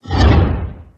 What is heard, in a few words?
dash; maskinfamy